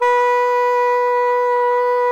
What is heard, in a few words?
B3B, tarogato; SoundBytes - Early Patches